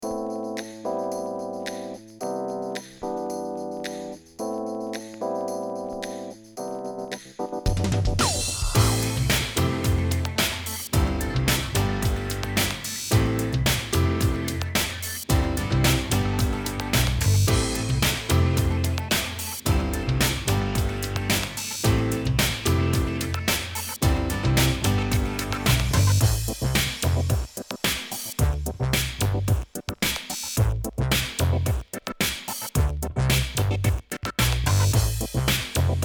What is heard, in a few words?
Raw stems